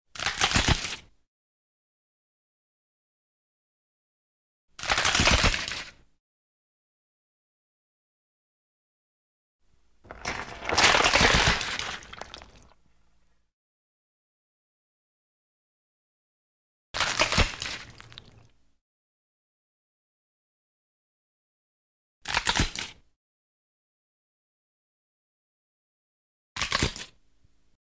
Bone Break Crack

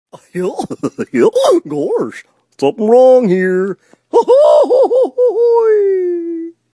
Goofy Laugh

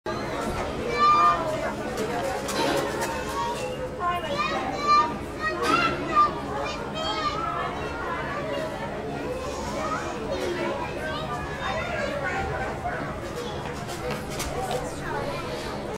Outdoor Restaurant Ambiance

